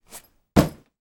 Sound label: foley bag baby changing drop onto floor; sound effects one